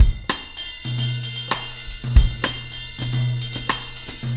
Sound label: Motown, R&B Drums; Legacy; Drummers of Motown